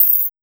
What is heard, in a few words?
Paper Kick; Sonic Foundry (Sony Creative Software); Esoterik Beatz; Paper Kit